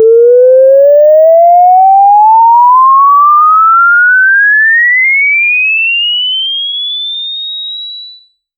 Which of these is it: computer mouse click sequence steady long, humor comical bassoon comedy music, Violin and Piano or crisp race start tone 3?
crisp race start tone 3